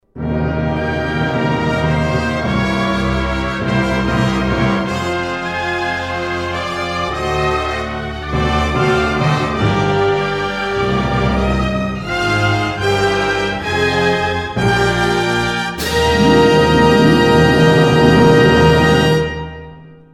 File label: Music Creature; End Cast